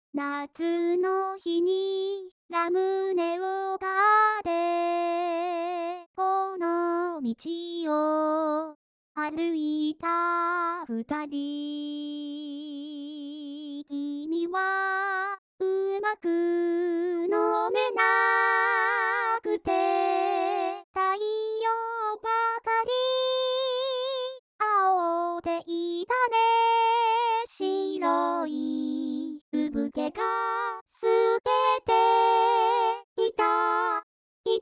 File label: vocal